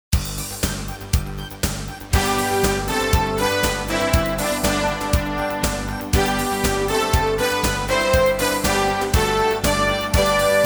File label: bgm